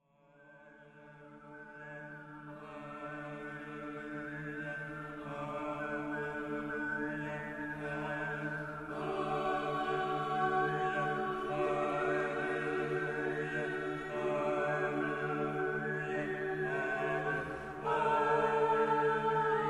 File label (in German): Halleluja, Obertonchor